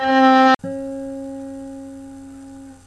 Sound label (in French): même note provient instruments différents